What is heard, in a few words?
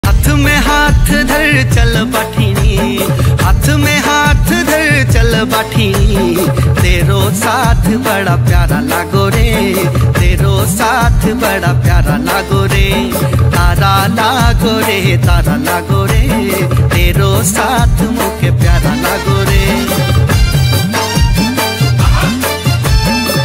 uttrakhandi ringtone